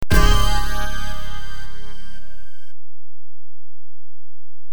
Round Over; Mortal Kombat; arcade gamerip 1992; Warrior Shrine Round Over

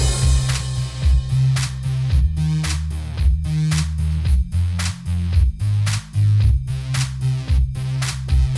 Menu; Imagicard Teenage Mutant Ninja Turtles; LeapPad Explorer gamerip 2014

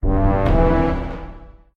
level Up

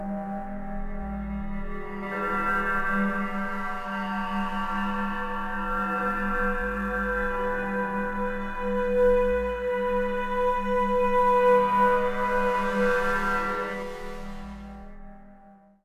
Loop fade; Heroes Over Europe

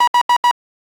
Alarm; Five Night's at Candys 2 (gamerip) (2015)

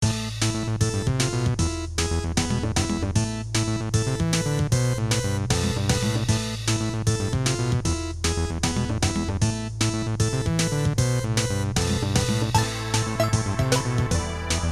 Slime Rain; terraria

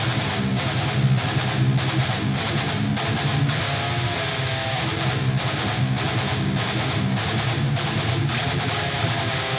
Drums and Jingles Evil Guitar F

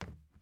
plastic step

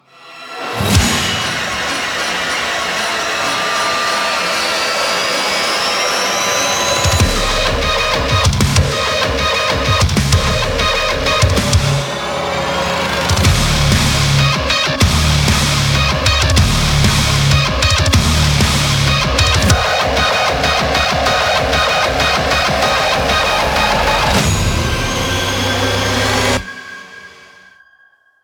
heavy; Doom

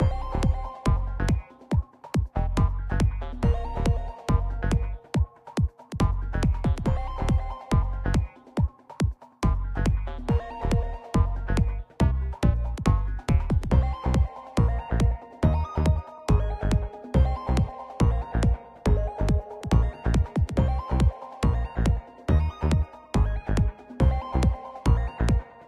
Arcade; double cross switch windows xbox one gamerip 2019

